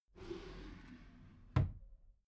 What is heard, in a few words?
desk drawer close soft